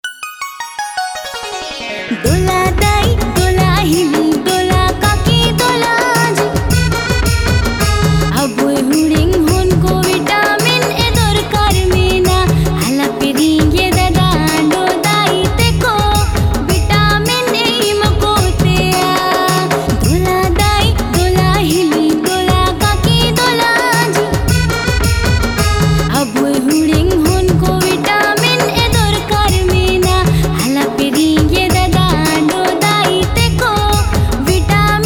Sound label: UNICEF JINGLE